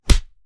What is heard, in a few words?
Fight Impact; SoundsCrate